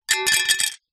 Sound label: impacts glass small pane sheet thin drop on ground